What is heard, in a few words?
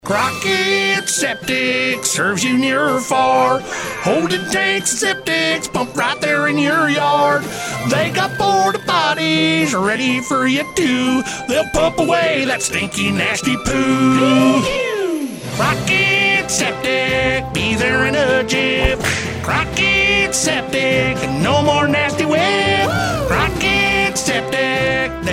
Septic Sing along